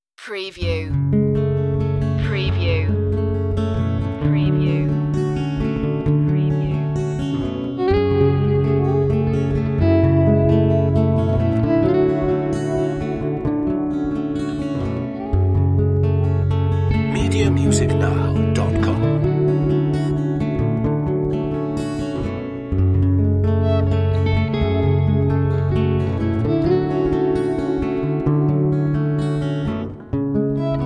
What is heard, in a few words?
falling leaves underscore